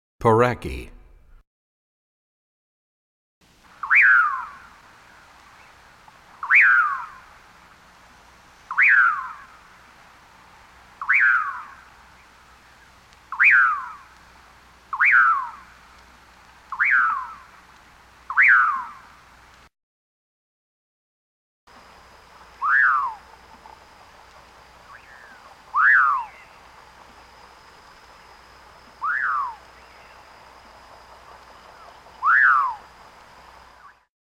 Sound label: Pauraque; Animals; Birds; 101BirdSongs